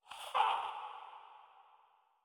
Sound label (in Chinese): 灵魂疾行声